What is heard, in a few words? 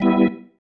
Stab One Shots, Organ